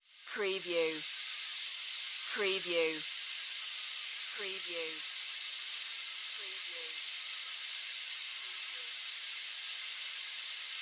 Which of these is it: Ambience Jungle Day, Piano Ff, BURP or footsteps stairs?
Ambience Jungle Day